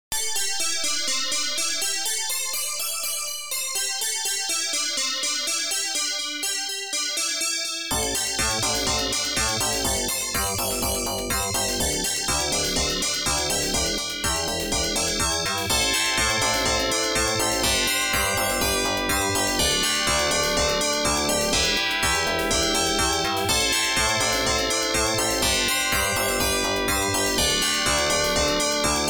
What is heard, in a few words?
OPL2; Shaolin Temple; Yamaha OPL2